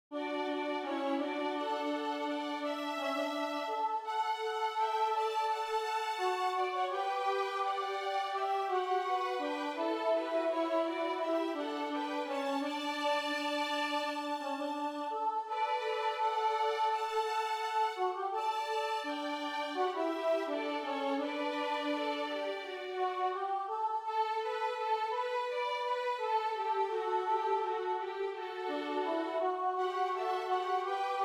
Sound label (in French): VIOLON; CelesteJerusalem